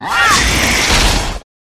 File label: Cat; Pet